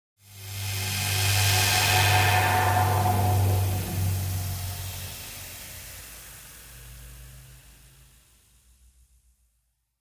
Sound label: Wash Elect Buzz Phase